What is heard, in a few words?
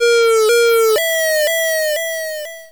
alarm; Flopsy